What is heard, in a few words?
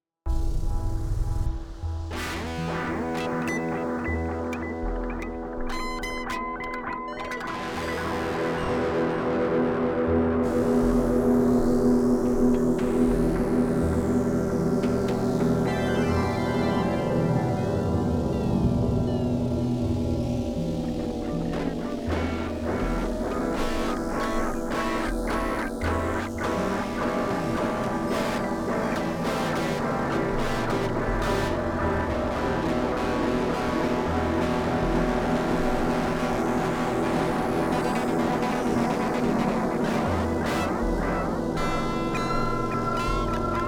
electric intro